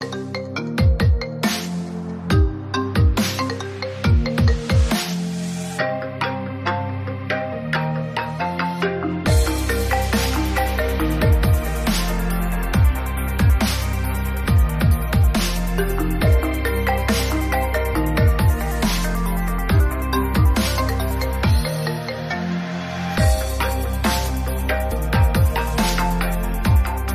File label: iPhone 8 original